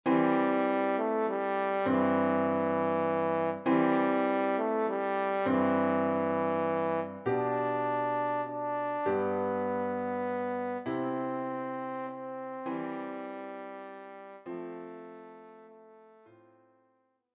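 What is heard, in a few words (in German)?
Stille Nacht heilige Nacht Horn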